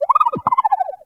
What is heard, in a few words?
Valley Water Creature; Warframe; The New War; Drifter Camp Valley